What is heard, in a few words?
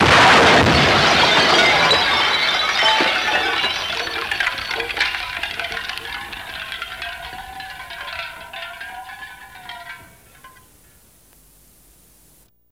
Car Crash Lots Glass